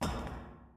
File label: catwalk, Footsteps